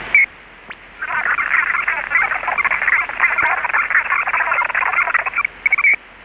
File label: HC 265 HF variant; HC-265 scrambler in HF-mode (1x300 Bd FSK preamble); HAGELIN CRYPTOS (CRYPTO AG)